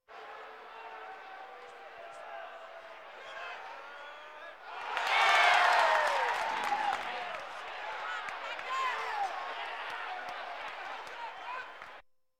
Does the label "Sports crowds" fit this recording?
Yes